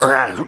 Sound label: Beats; Bath; Neighbours From Hell Console Edition; GC, Xbox